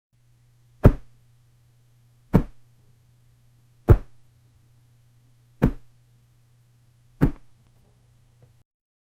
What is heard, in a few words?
the pillow fell to the, pillow